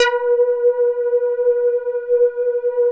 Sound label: LEADS; Masterbits; Vintage Voltage